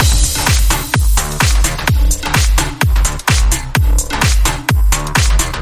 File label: Fire Beat